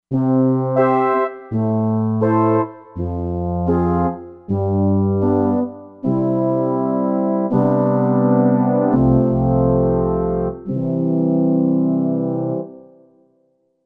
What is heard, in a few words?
Smooth Brass